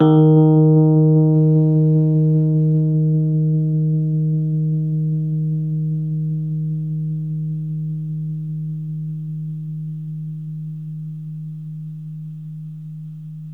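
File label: Rhodes, D#2, left; 3D Sound; 3D Keys VOL-1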